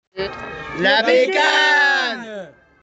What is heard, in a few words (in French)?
Cri de guerre